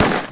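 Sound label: pistol; CD Action 47a 2000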